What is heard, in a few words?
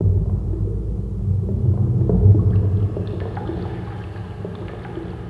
ambience